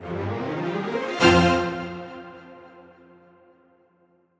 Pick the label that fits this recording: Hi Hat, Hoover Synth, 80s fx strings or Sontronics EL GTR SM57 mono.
80s fx strings